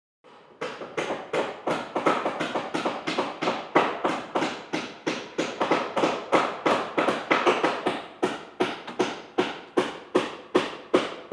Construction, Ambience Home Construction Hammers, Saws